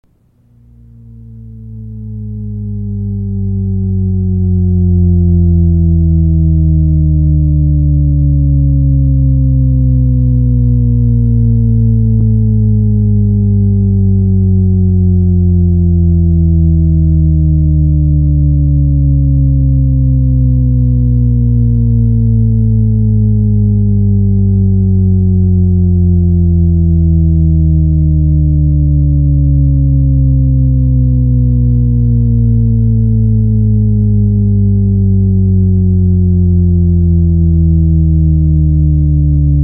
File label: Shepard Tones